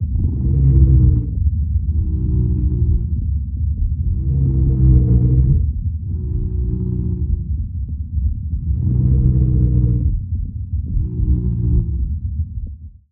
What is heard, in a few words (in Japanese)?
類鼾音